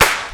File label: modern sizzle acoustic snare f sharp key